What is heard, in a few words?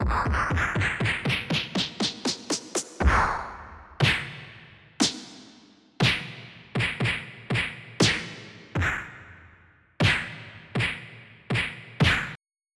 punch; Populous Demo